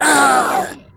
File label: pain; awesomenauts